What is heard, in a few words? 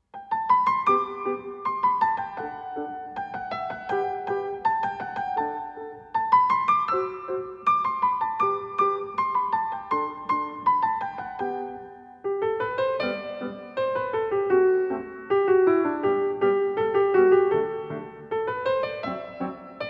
Rondo G Dur, piano; piano favourites